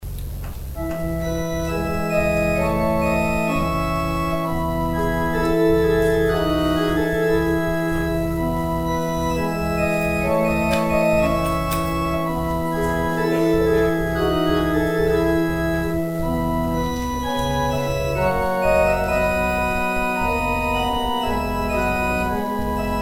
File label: organ interlude; Offering interlude